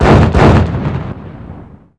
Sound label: tank